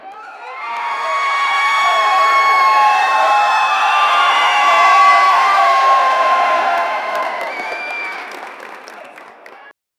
efeitos sonoros de platei, audience

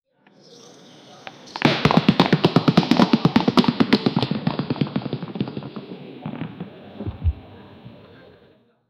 um cavalo corre no trampo; sport

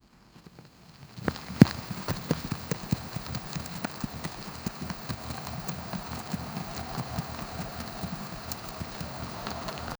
para um jogo pixel de, running

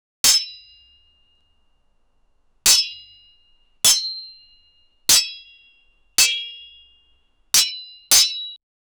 duas espadas se chocando, swords

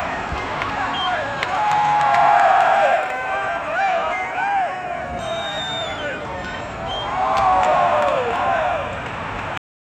som de dois jogadores de, tackle

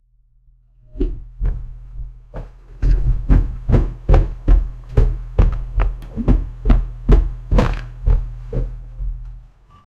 batidas e sons de, haunted house

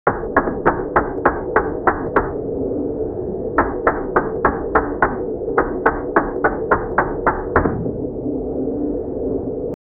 um passo em uma dungeon, pixel art

